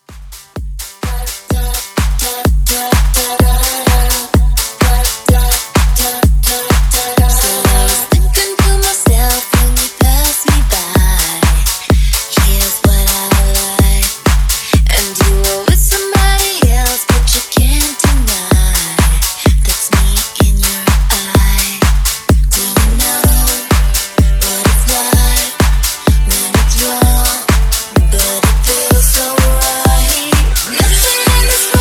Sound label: Pop 90s Throwback Dance 127 BPM